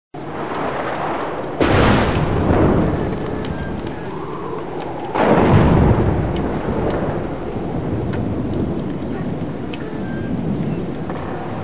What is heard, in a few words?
storm sfx